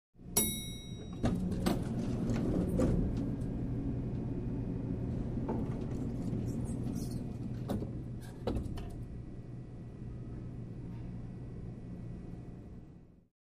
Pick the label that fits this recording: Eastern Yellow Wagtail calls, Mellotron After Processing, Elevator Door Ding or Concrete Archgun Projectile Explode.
Elevator Door Ding